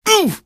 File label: pain, barney; hl2; Source engine